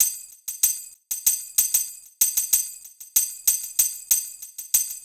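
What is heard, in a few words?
Hip Hop Tambourine, 095 bpm; Digidesign; Pro Tools; Pro Tools Essential Loops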